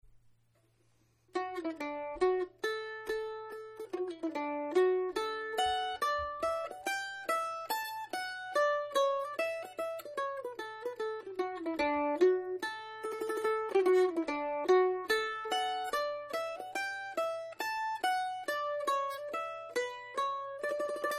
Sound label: country waltz, bush banjo